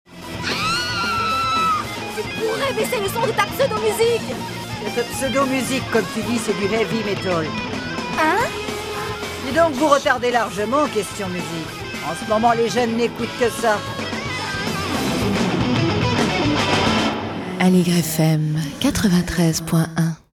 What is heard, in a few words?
heavy Metal